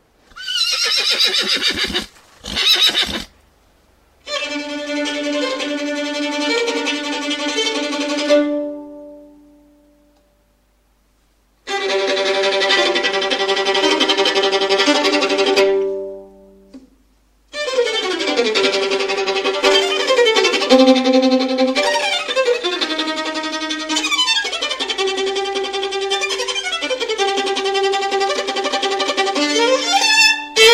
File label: A Horse and a nervous Violin